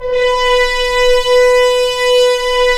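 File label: french horns, swells; Roland LCDP06 Brass Sections; F.Horns FX+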